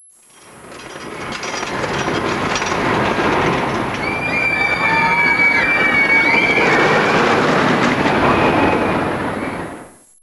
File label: roller c; workshop